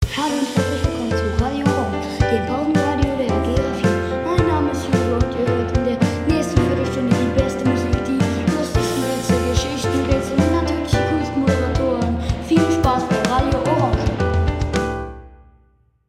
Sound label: jingle